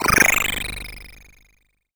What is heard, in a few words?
Alien Sound 8 Bit